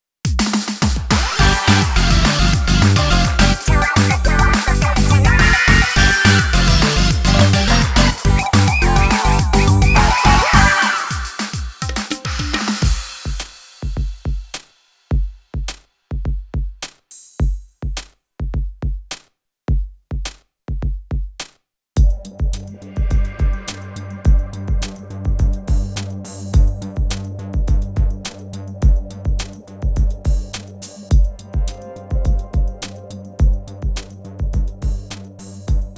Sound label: Battle Victory; Splatfest; (In-Game Version)